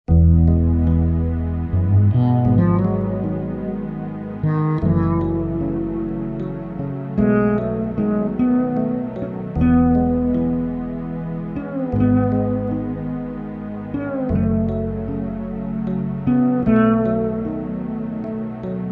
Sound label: fretless